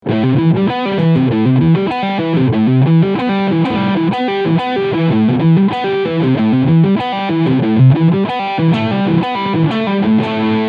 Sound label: ARPEGGIOLINES